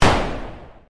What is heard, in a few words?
turret fire; weapon sounds; Factorio Paranoidal mod; weaponSoundsRedone